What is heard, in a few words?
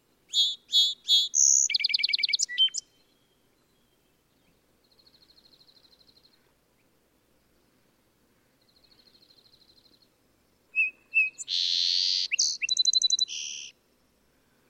Song Sparrow backyard; Sitka